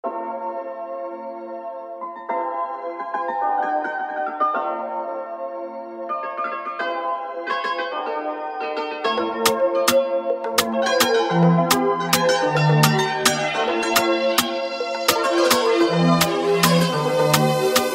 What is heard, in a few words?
Ringtone Mobile